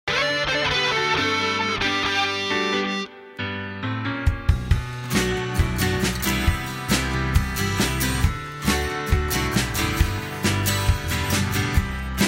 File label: band short karaoke